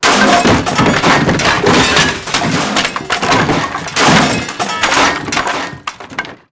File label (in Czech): rachot; Mafie 1